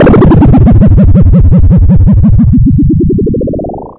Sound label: wobbly pulse up n down, alarm sounds